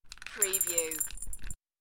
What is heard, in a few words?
Biscuit bowl; beanstalk audio